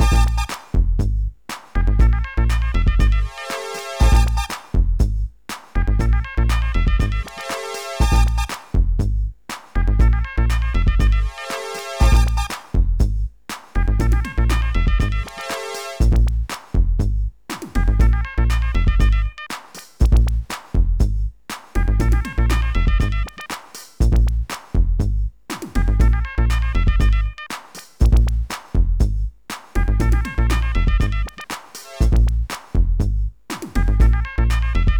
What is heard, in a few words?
Upbeat